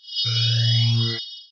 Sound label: Mage blue rain charge